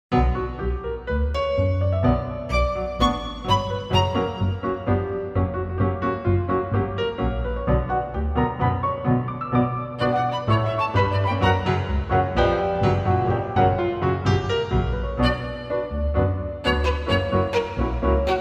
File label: tango